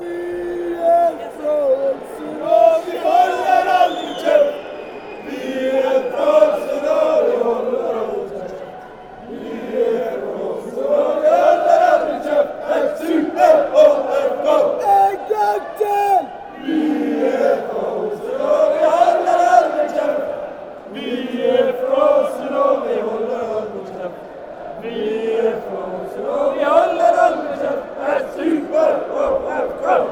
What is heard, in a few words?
aalesunds chant, fanchants